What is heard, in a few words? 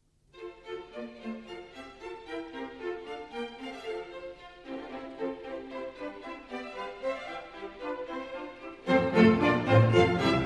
bourree from water music; handel; barroco